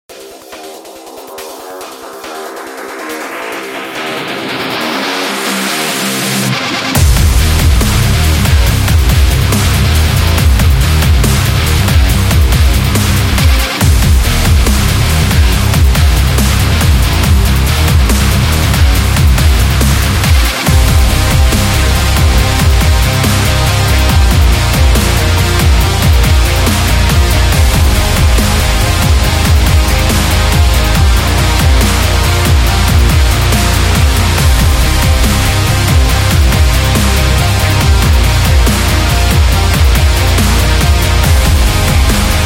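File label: Energy, rock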